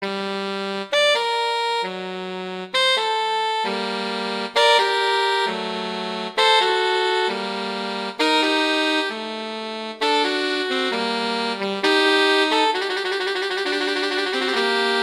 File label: Adagio; Telemann; Canonic; Sonata 1